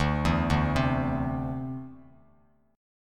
D 7th Suspended 2nd down Bass Standard; D7sus2 arpeggiated

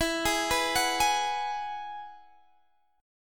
E Major Add 9th

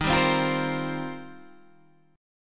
Eb 6th Add 9th, Guitar, DADGAD

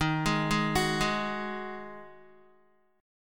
Eb Minor Double Flat 5th, Guitar; arpeggiated; chordshape; Ebmbb5; JGuitar

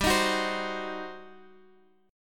G Major 7th Suspended 4th Sharp 5th, G

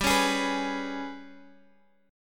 Ab Minor Major 7th Double Flat 5th

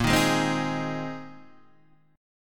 A sharp 7th Suspended 2nd Suspended 4th; A#7sus2sus4 Chord {6 6 6 5 4 4} strummed

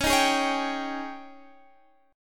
Ab Major 7th Suspended 4th Db

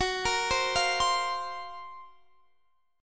Gb Major 7th Flat 5th Gb, Guitar Standard; arpeggiated; JGuitar

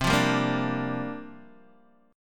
C 9th Suspended 4th, Guitar, Open D

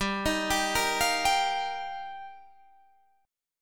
Eb Major Add 9th G

